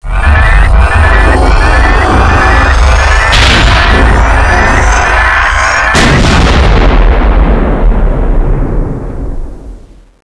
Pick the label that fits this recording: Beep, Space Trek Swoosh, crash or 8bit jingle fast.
crash